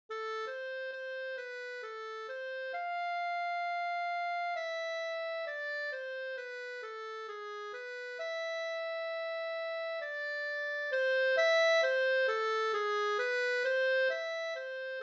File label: Clarinet slow, etudes; CLARINET Lyrical; Region 23 Middle School Etudes for 2026-27 (Year C)